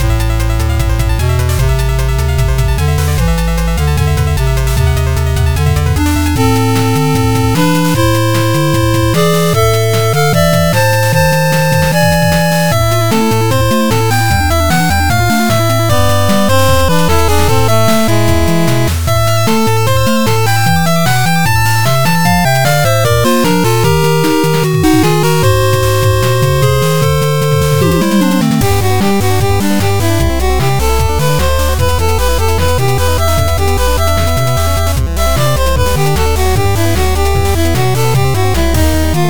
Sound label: battle famicom